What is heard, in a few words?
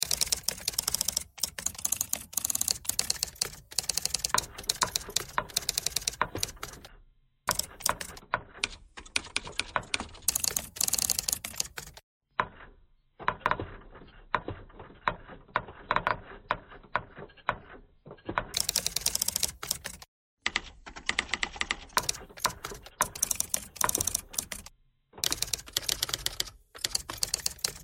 typewriter thread pentatonic